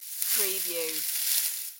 Grass Movement Foliage

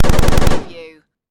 Pulse Rifle Short

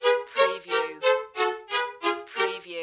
Music Strings Descend

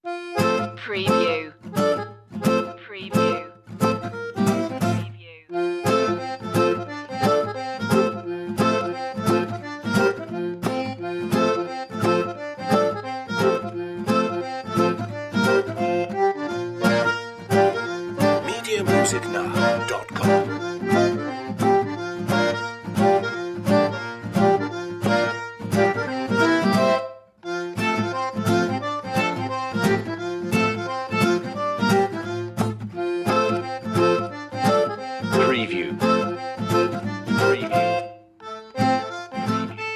accordion guitar

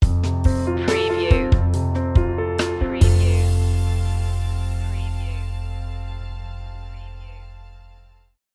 acoustic mood stinger

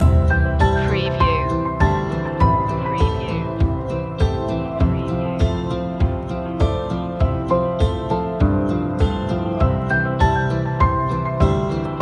no vocals

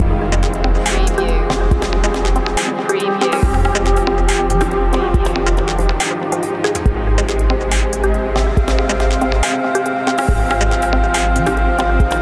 deep dub garage loop

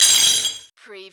Button Sound - Metal Clamp Slide

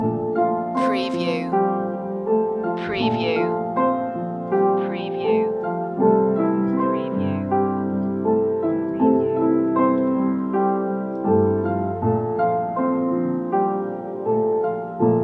slow summer loop

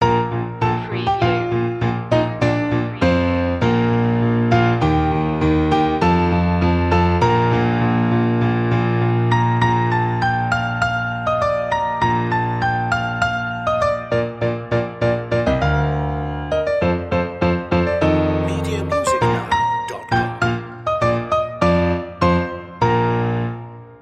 joy to the world piano loop; media music now

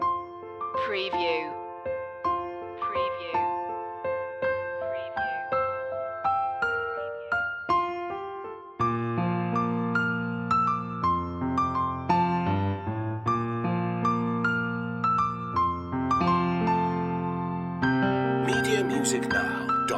silent night piano loop; media music now

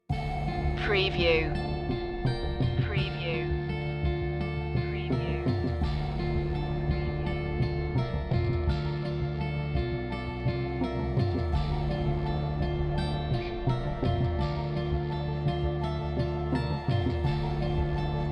coven no drums no choir no vocal

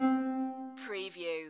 musical

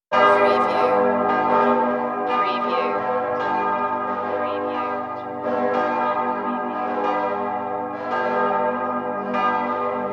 church bells

